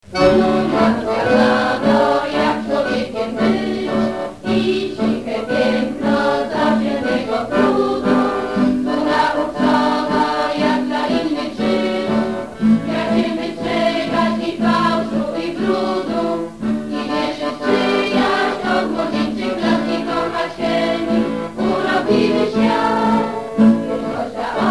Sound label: hymn